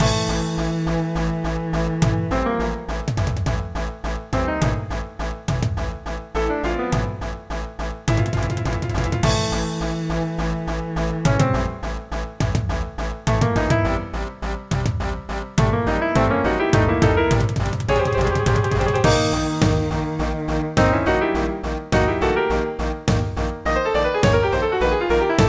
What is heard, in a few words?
bgm battle boss; beastie bay android game music